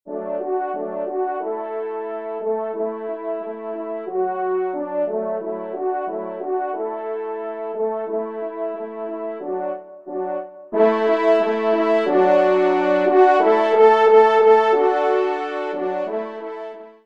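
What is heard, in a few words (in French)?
ensemble, trompe cors; Fete au village; Pantalon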